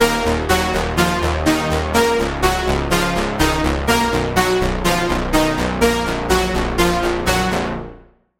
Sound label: Lead; Routed Sound; Sylenth1 Expansion - Noble Gangster Vol.1